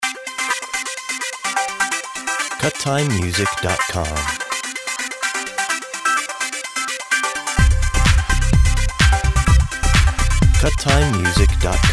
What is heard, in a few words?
Electronica